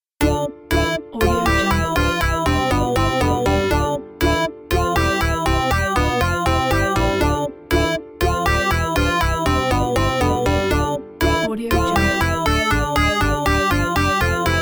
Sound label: funky arcade stinger